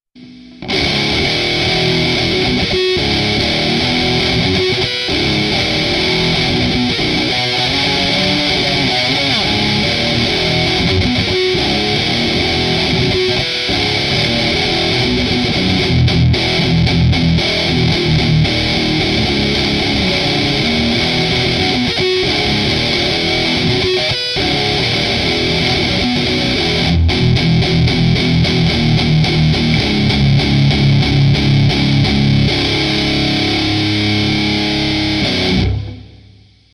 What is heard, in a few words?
Cyclone Dirt Mids Up